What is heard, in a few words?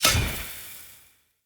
Sword Throw Loop Recall End; Weapons; Player; Swords; Fenn Jotar Sword